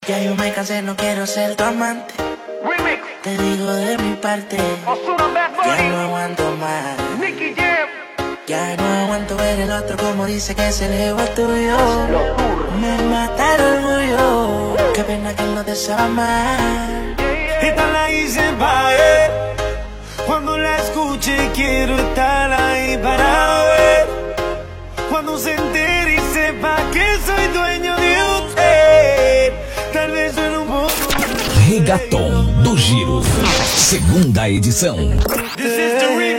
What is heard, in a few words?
Reggaeton